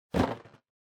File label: Balloon Noise